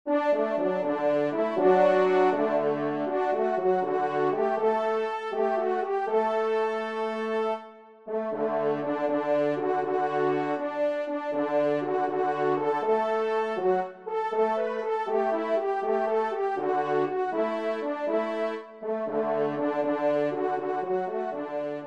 marche triomphale, trompe; Marche Triomphale des Louvetiers; MM. de Beauvais de Saint-Paul; 3e Trompe

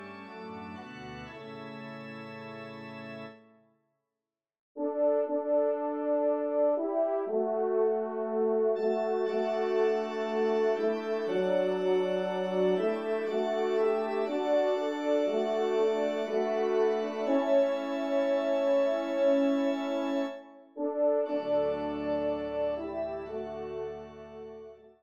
Elevation Trp Org; messe cantin

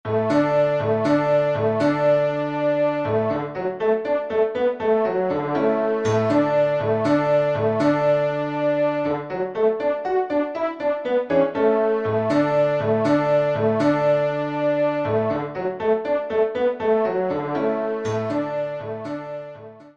Petit Air de Chasse, trompe

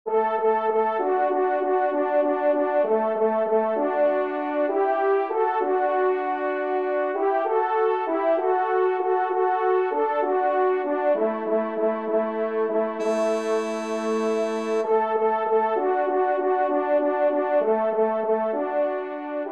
Fanfare Do Maj TRP; 2e Fanfare; Corrette

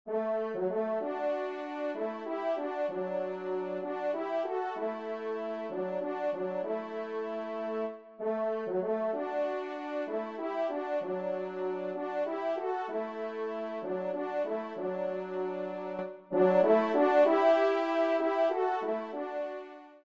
trompe; Chapelle Saint-Martin – Moulins-le-Carbonnel (72); 2e Trompe

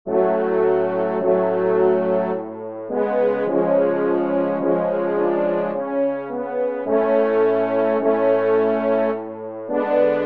Trompes seules; Sarabande Haendel Teknia

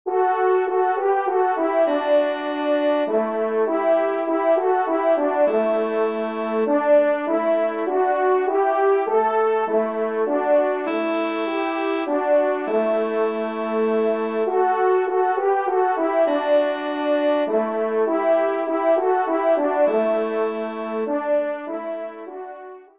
Menuet Do Maj TRP; Corrette

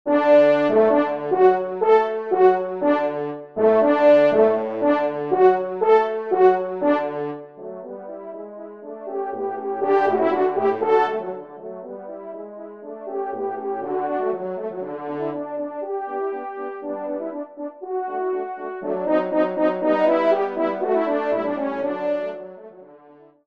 Trio Cors

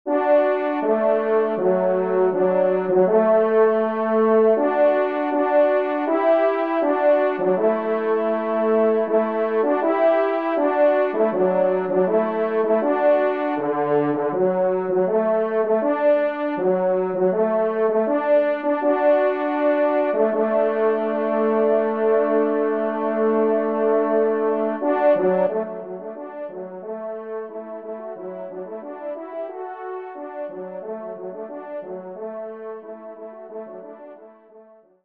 polka, trompe; Polka des joyeux piqueurs

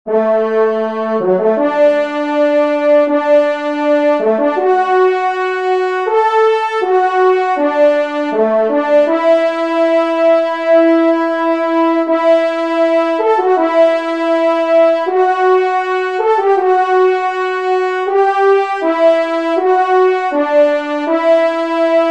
Entree, trompe; Devert; Entree 1e Messe

